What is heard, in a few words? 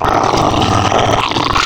Dog, Animals Wavetables; Cymatics; Serum; Animals for Serum - Gold Edition